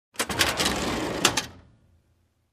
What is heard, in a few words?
Extending metal compartment